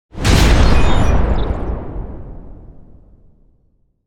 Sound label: Shockwave explosion big hard impact glitchy